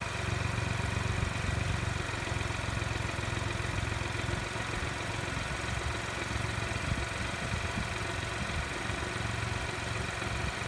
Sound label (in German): Mini Clubman F54 3 Zylinder